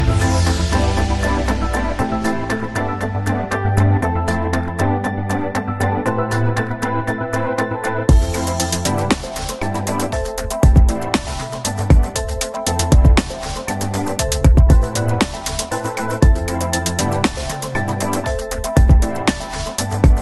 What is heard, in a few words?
english sweet ringtone